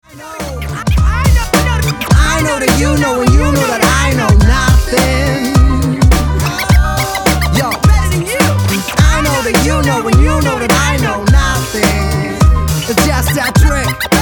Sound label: boom bap swing